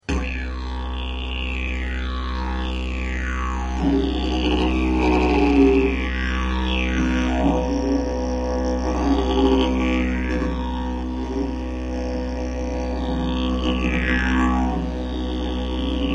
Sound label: didgeridoo